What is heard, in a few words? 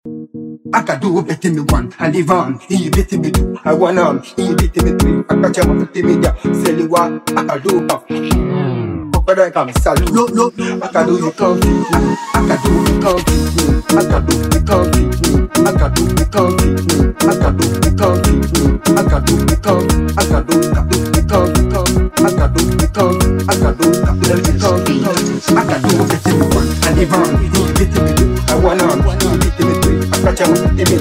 Afrobeat